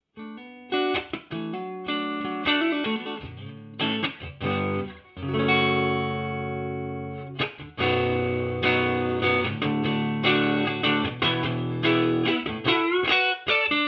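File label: clean, guitar amp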